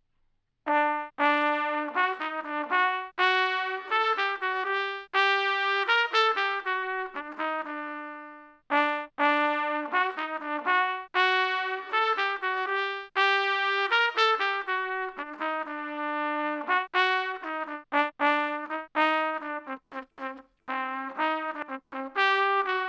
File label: trumpet; havanagila; Audio Analogies